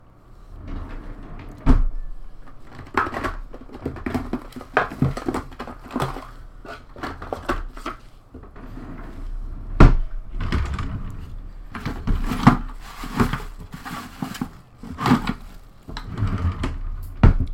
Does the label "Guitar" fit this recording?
No